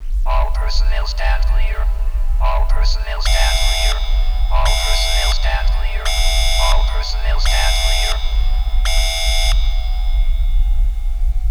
cargo door; svencoop

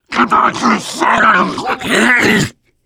charger lurk; cs16; zombie plague

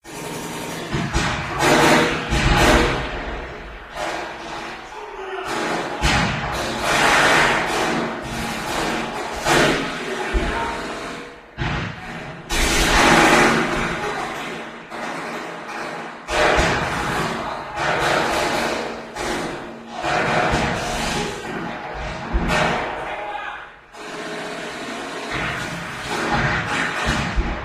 indoor battle sfx; svencoop; scmod; spaceprisoner

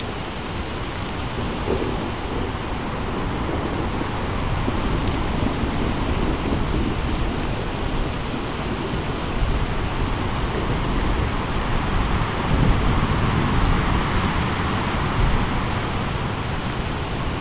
ambient outside rain wind thunder; svencoop

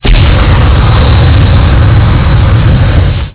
flamer; cs16

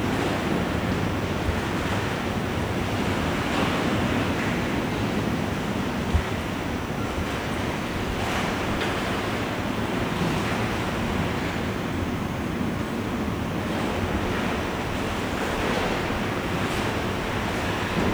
fact amb; svencoop

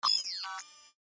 whirr; gmod; cracker

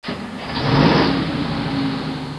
vehicle; cs16; lancelotgames; bomba

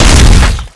charger smash; cs16; zombie plague

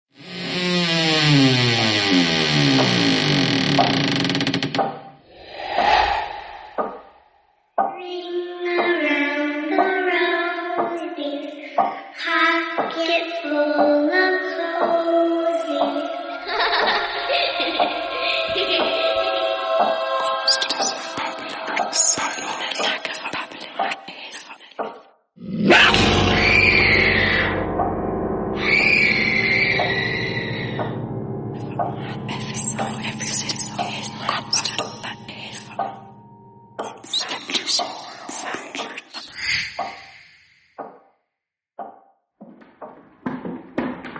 spooky noises; svencoop; sc laundromat

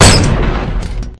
mortar; ambience; cs16

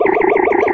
Floating Disc; svencoop; sc laundromat

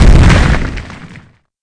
step; svencoop

